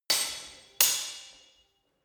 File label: sword hit fast sword double